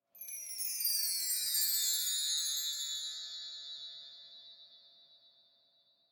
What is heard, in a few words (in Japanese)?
ウインドチャイム ロング